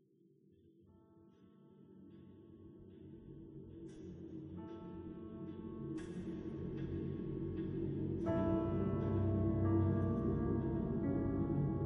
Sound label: Tutorial Music